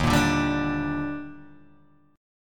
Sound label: Eb Major 7th, Guitar, Open G